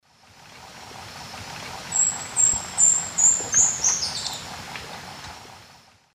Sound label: Phaethornis bourcieri, song in flight; S. America; Eastern Lowlands